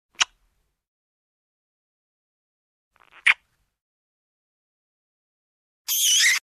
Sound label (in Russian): zvuki poceluya